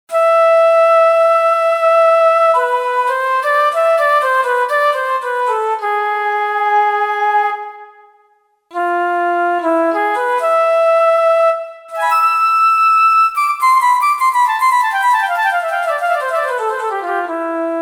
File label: Flute mod, Roland JV-880; Factory Patches